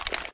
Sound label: zoom in; arccw go; sg556